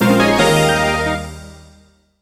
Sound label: Success